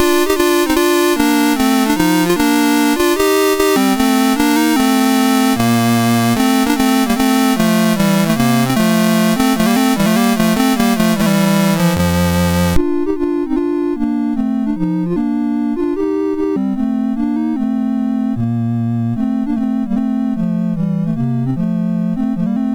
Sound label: Sonata in B Flat Major 3rd Movement Presto, MOS6581R4